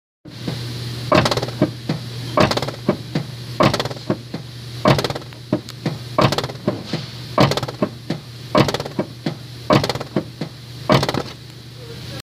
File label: vent noise